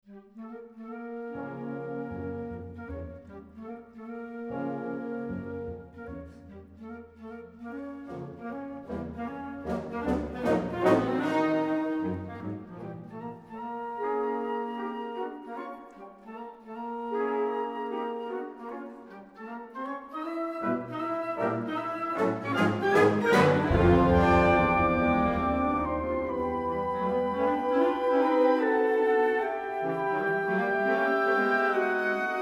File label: Caprice for Wind Orchestra